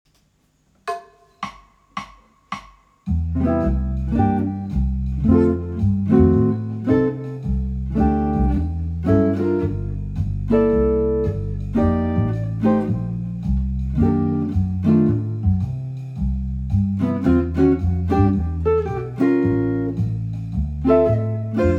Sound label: blues jazz